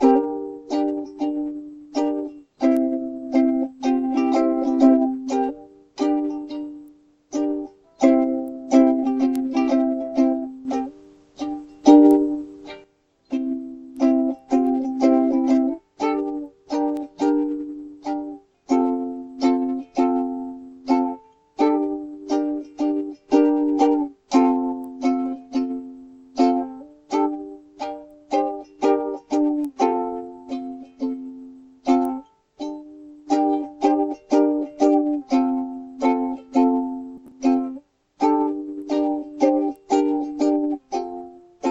uke jam; weeklybeats-2014

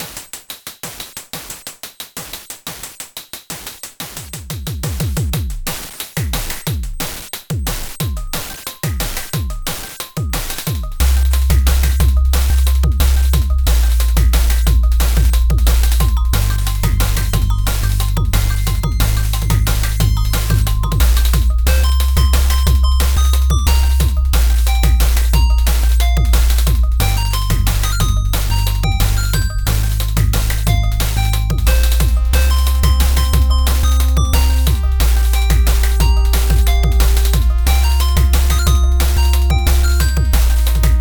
liquid dnb opl1; weeklybeats 2024

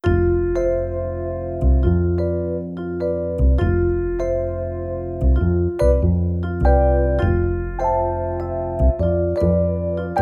chromatic waltz

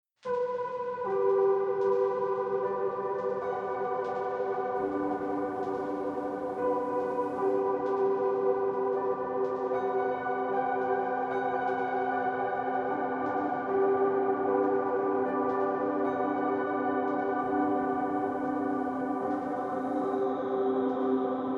copper ambient; weekly beats 2020

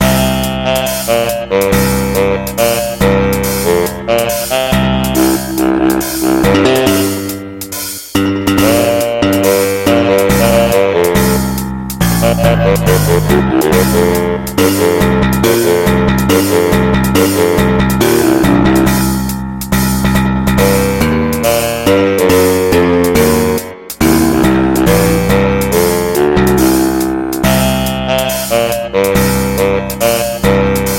jazzazz; weeklybeats 2012

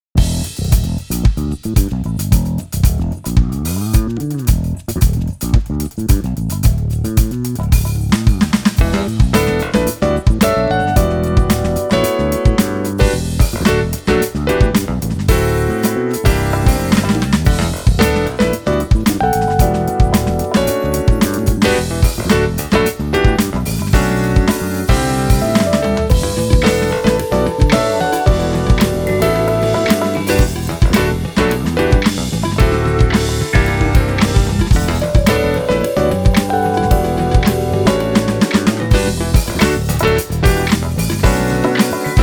live drums vs c80 piano

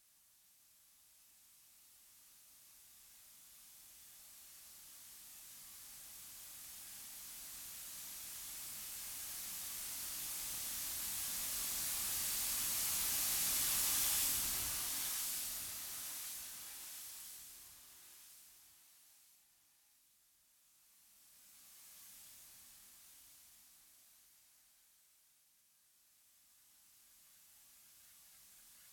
noise2noise; weeklybeats-2014

